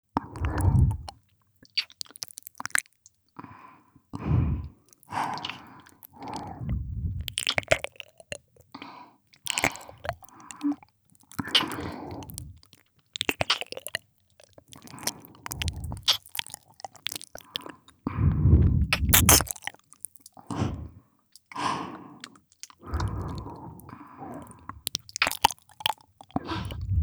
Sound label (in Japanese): キス＆右耳舐め♪; トウカのキス＆右耳舐め♪